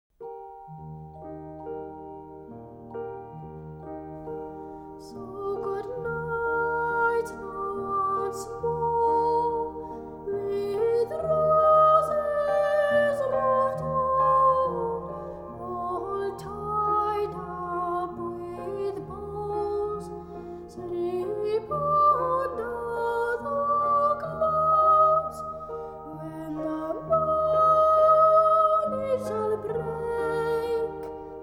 The Choir Cradle Song

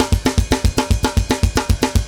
metal

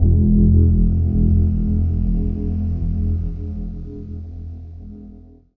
Bell FX; Dance; SFX

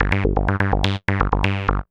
Tech Bass; Rock; Synths & Keyboards

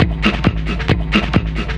Drums Techno

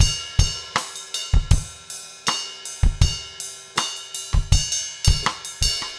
trip hop 80 rims

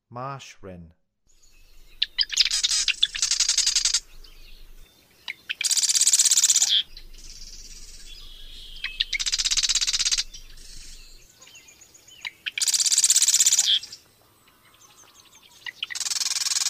Marsh Wren, Songs of Birds of North America; Western USA; Western USA Songbirds

